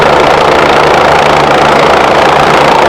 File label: blade vortex; fgdata